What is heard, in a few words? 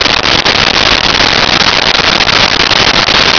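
ambience crowd huge loop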